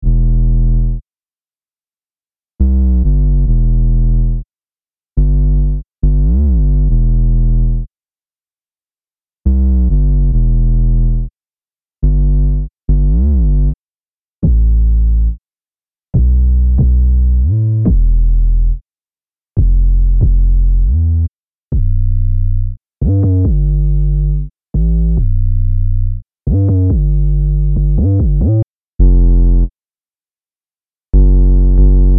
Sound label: BD UK Drill UK Grime